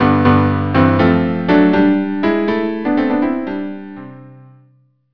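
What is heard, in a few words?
cello duo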